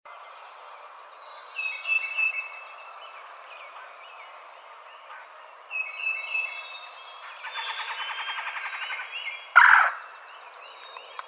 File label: Red-bellied Woodpecker